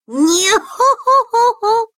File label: Nyohohohoho